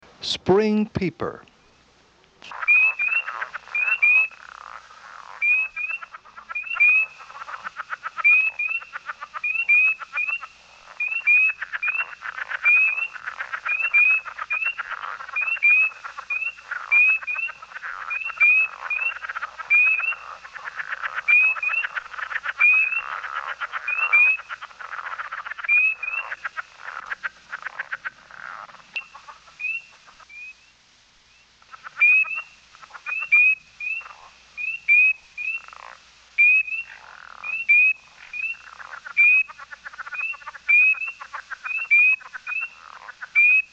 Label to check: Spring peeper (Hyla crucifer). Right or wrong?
Right